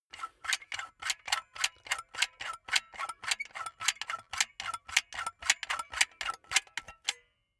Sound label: silicone gun empty pump medium; berufe; handwerker; soundpool